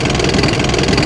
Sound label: old peon tank, rumble; golgotha